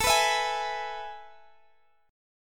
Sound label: A Major 7th Suspended 2nd, Guitar, Standard; strummed